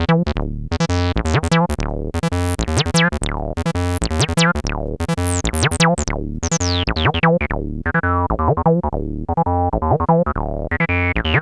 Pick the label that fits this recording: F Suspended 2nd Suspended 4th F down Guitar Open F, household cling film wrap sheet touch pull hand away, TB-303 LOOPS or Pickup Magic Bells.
TB-303 LOOPS